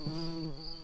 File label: bumblebees, Lucorum queens